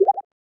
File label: decision, button